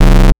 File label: Star Instruments Synare PS-1